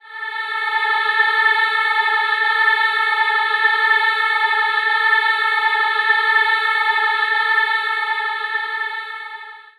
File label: Space Nuns A, Ambient Pads; Zero-G Seismic Frequencies; 90 s Sample CDs